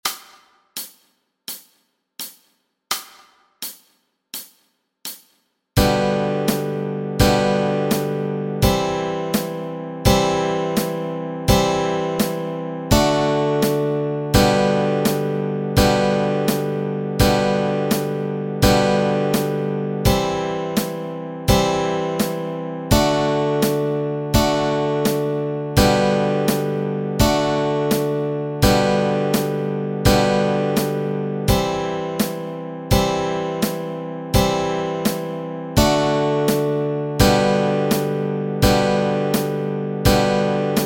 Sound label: Chords Track